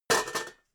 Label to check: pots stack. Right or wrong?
Right